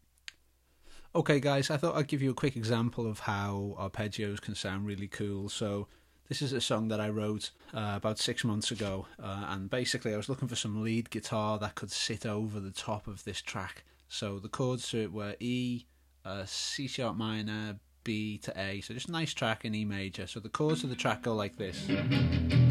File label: Arpeggios Guitar; Tips